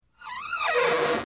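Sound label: door close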